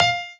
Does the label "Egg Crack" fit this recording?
No